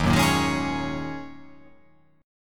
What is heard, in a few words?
D sharp Minor 11th D sharp, chord